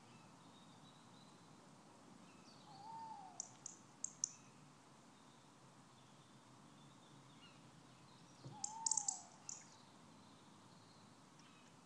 dusk birdsong, cheaping bird and lots more from the owl